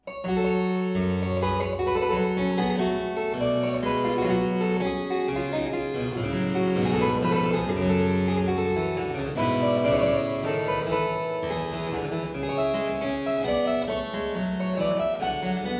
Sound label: Suite in G minor - Courante, harpsichord; Buxtehude; Complete Harpsichord Music